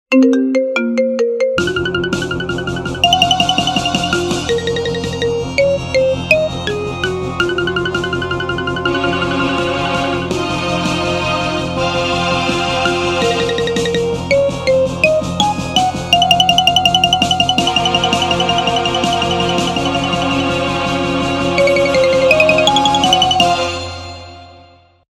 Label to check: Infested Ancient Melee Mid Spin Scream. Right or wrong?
Wrong